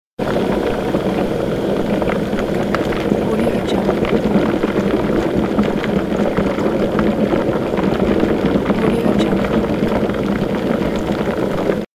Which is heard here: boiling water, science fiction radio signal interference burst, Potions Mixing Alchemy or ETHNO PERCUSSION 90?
boiling water